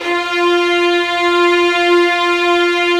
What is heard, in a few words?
Violins, Arco; Arco Violins III